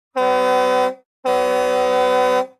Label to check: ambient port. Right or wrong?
Right